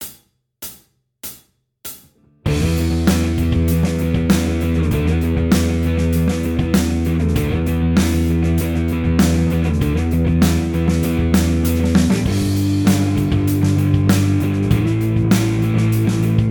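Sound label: Slide jam track, Guitar; Guitar Techniques 2019 Issue 290 January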